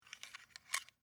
Skeleton Key Remove, From, Door, Lock; Foley; Jangling Sound Effects

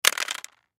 Cell Drop; Technology; Blastwave

